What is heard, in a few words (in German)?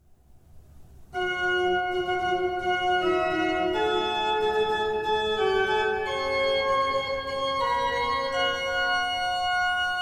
Glocken; Suesser die Glocken